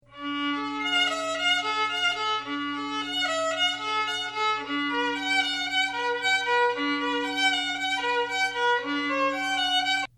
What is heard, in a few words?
Bach Cello Suite sample